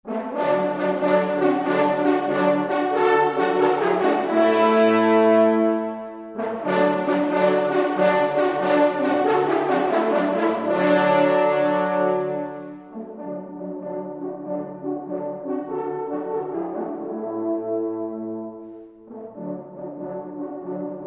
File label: Fanfare; Leopold Kozeluch